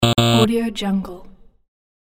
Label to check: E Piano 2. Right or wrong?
Wrong